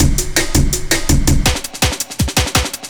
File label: Machines Break; Jungle; Breaks; Blu Mar Ten - Jungle Jungle - 1989 to 1999 Samplepack